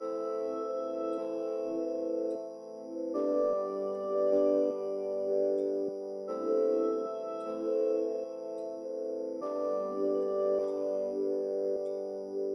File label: 153bpm Pad